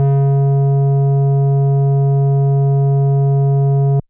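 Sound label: FM Sq Dist DX100 C#1; square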